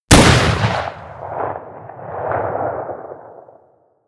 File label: pistol muzzleloader crisp stone; schusswaffen